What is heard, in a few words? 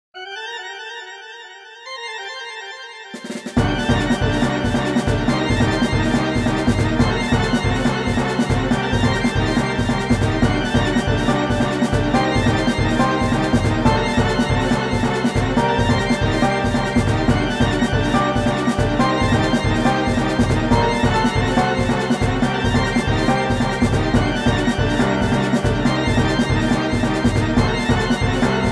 tension rising